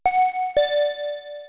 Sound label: Chime